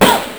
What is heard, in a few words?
Snare Go! 11757Hz; Sonic 3; M8; Genesis